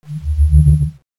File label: forcefield